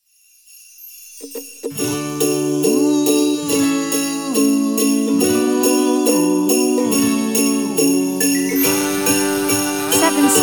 Christmas Magic Ukulele

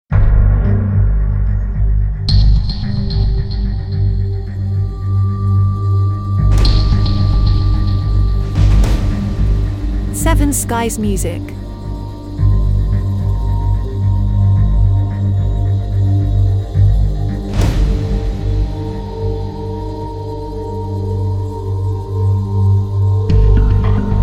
Ambient Suspense

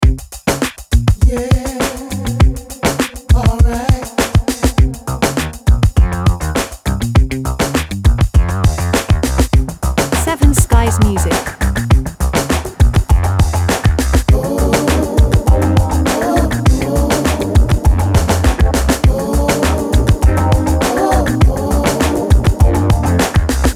Funky Thing Loop

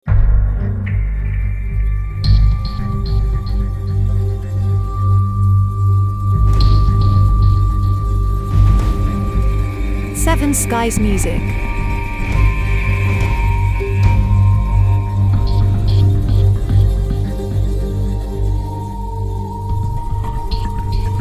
Ambient Dark Film Series

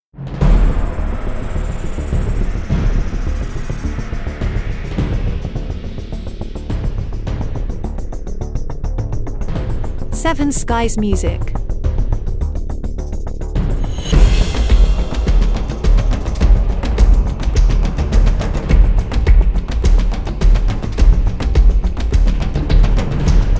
Battle drums

